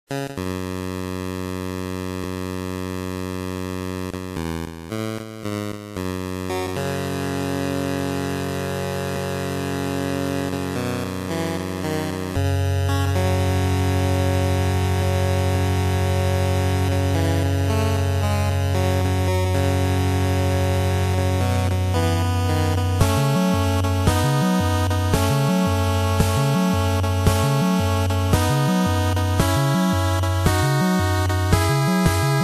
music title theme; batman nes

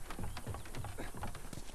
footsteps dry wood

